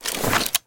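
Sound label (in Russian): резкая короткая перезарядка оружия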